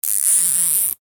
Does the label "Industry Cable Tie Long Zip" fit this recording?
Yes